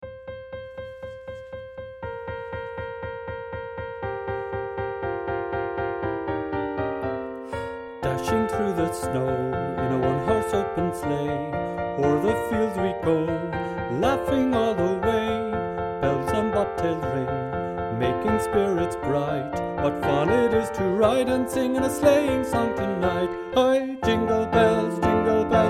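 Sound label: Jingle Bells Soprano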